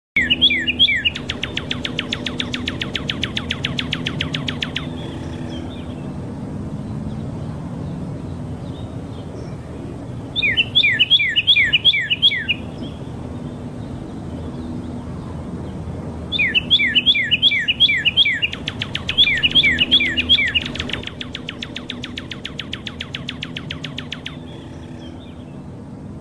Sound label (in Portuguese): Canto do cardeal vermelhao